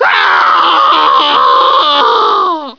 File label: arghhh